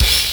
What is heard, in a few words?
Crash, Genesis; Vapor Trail